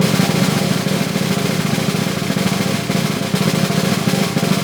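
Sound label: snare roll, Synclavier Percussion; Synclavier Percussion 2; World & Orchestral; ILIO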